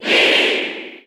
Grito del público; Ingles; Mii; SSBU

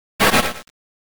Grito de Nincada

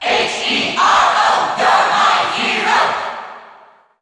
Grito del público (Ingles); Heroe; SSBU

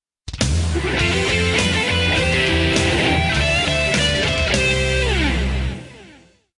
Tema de victoria; Meta Knight; SSBB

